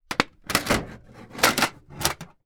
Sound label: Metal; TOOLKIT vol.2